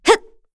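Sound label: Vox Jump, Korean; FreyB; kingsraid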